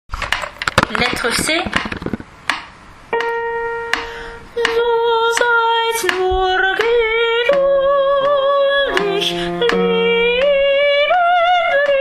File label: Brahms requiem allemand soprano; lettre C